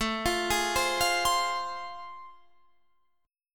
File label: A Minor Major 7th Sharp 5th, Guitar Standard; arpeggiated; AmM7#5; 17,20,18,17,18,20; JGuitar